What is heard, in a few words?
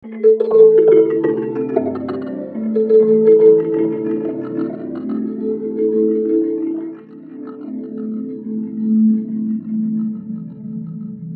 Musical Music, Musical Music Box Reverb Wash; Miscellaneous Musical Sound Effects